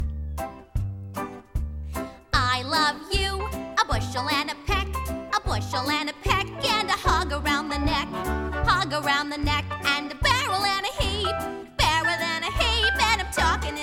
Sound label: Vocal; The School Musical 2024/25 - Guys & Dolls Jr.